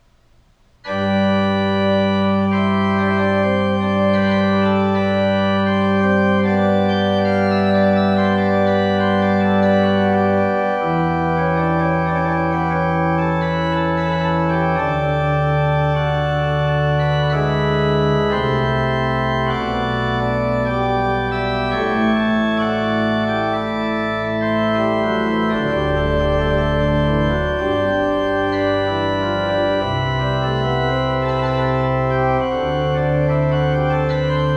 Prelude in G Major, Organ; Johann Pachelbel; Complete Organ Works

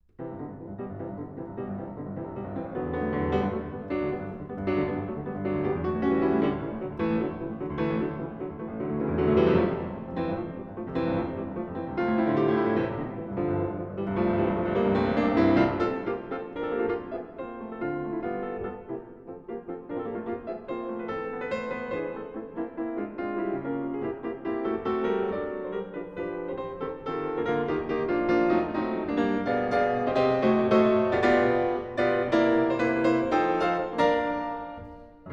Suite for Piano

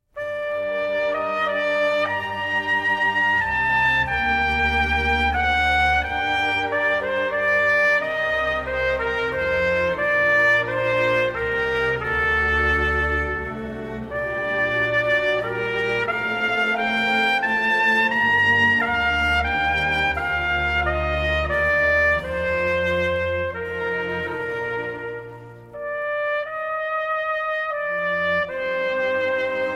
Trumpet Concerto in G Minor, Lento; Baroque Trumpet Concertos

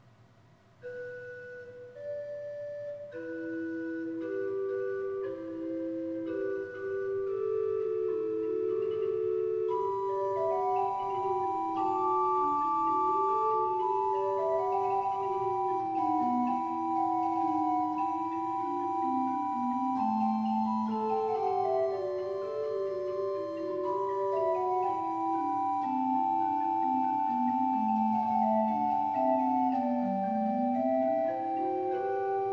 Chorale Prelude, Organ; ''Meine Seele erhebt den Herren''; Johann Pachelbel; Complete Organ Works

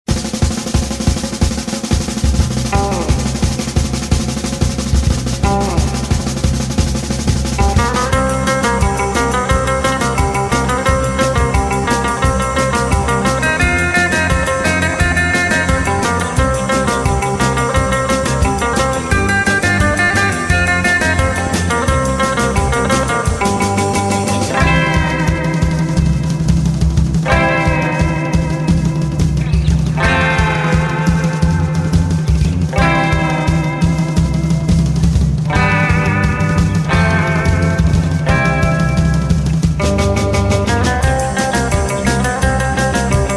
Guitar

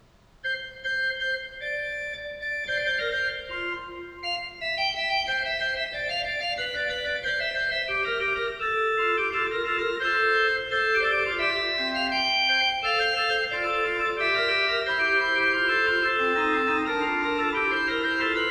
Fugue, Organ; Magnificat sexti toni; Johann Pachelbel; Complete Organ Works

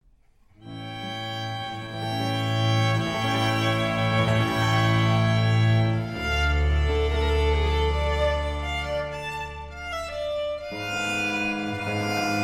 Concerto for Strings in C Major, Largo; Vivaldi; Concerti per archi II; Concerto Italiano

